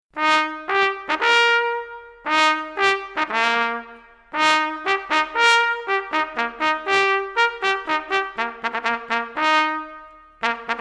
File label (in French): Sonnerie Marche De Retraite